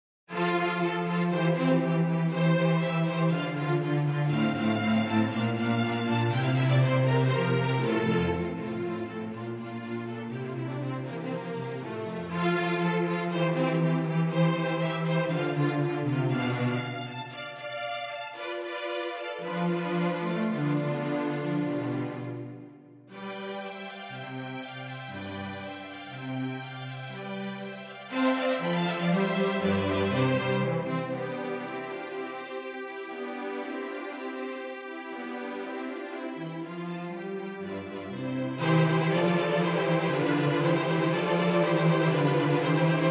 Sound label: Sinfonia in F Major